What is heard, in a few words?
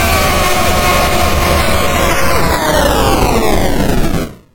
dies (tragic)